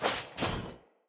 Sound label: Foley Heal; Enemy; Sinecure; Banneret